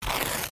Bond paper tearing; Free Paper Sound Effects

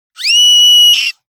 High pitched scream girl child kid; gfxsounds

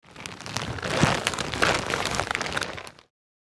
Old shopping bag rustling

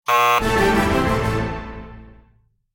Game show wrong answer; film and tv

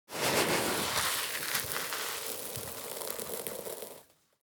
Pouring sand on ground slowly

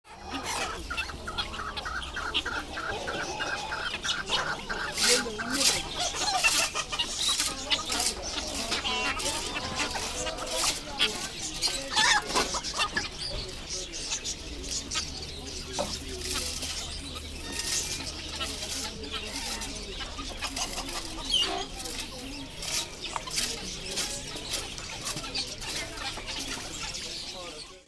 Animal market ambience chickens and birds

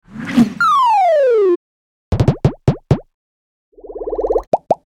Infographic graph build up pops whooshes

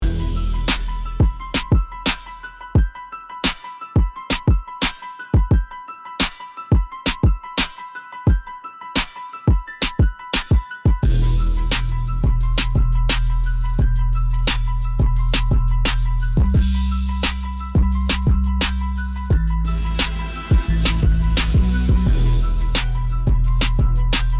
Rap beat street symphony

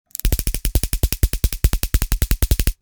Bug zapper rapid electrical zaps; insect

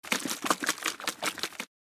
Water spray bottle shaking

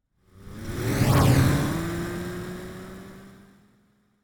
Car passing by fast

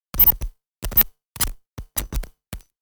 Connectivity malfunction electrical interference; sound design effects

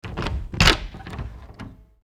Squeaky wooden door opening

